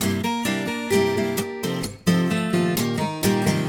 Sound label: Guitar before stereoizer; stereoplacer